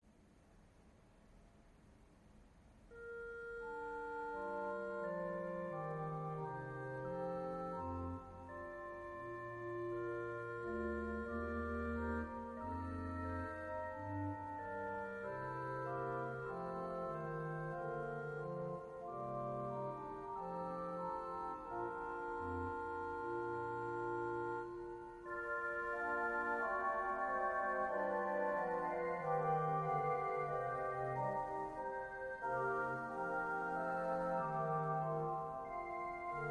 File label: Quintadena Celeste; p450 ranks